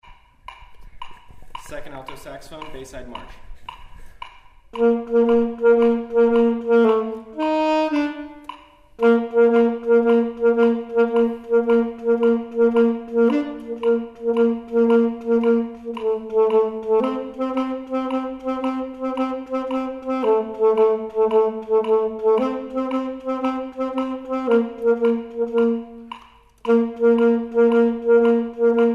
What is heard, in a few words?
Alto; Bayside